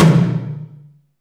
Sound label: HALL MID, TOMS; Back In Time Records; Big Bang